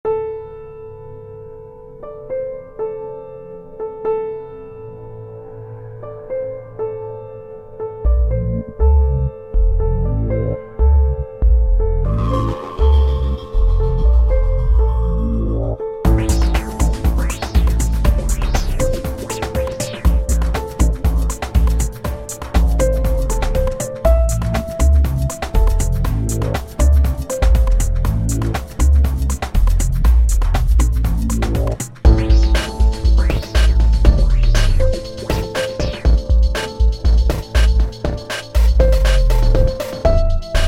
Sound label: piano mayhem